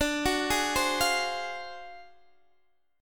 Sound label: D 7th Sharp 9th Flat 5th D down Guitar Open D